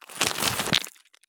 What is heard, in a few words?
stop gravel; Escape from Tarkov